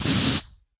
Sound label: Spray; ModeAudio